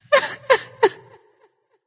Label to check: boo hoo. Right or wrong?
Right